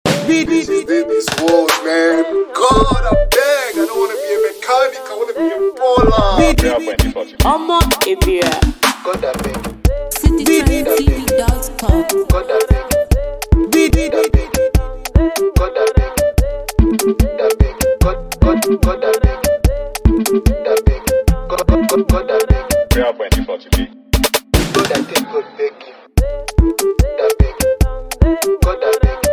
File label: Beat